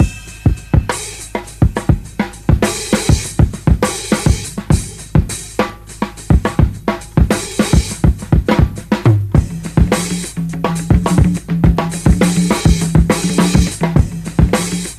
anteater, Drum loops, Funky

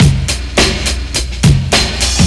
Drum loops, Funky

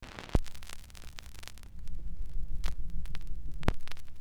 crackles, Vinyl

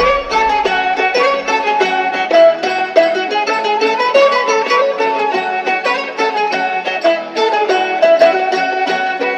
keys, phonky loops

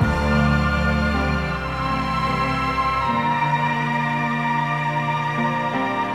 strings, Loops, Sad

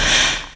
breath

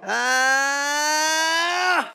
scream long; ooh ahh

